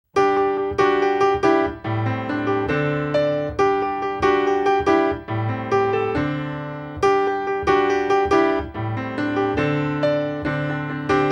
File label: accomp